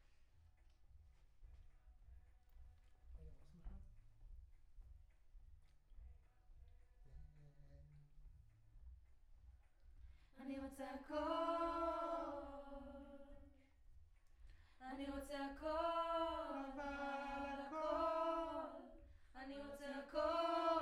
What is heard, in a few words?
Choir; Decca LR